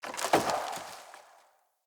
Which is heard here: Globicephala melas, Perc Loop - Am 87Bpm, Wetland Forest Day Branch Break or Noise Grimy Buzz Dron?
Wetland Forest Day Branch Break